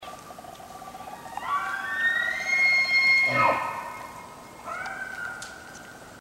bugle locator, elk

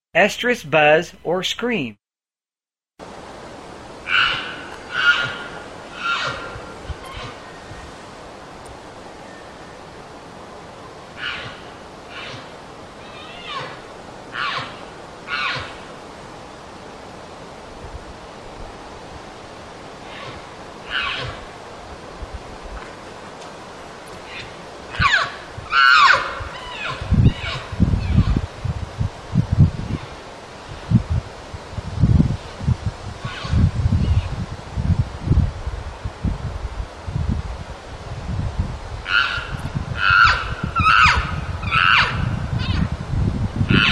estrus buzz, elk

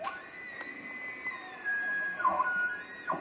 long bugle, elk

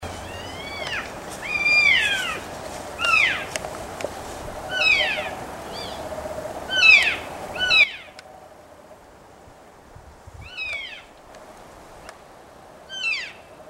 cows and calf, elk

